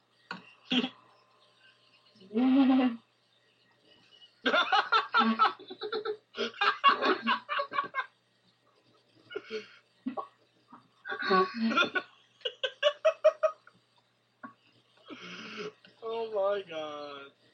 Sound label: laughy laugh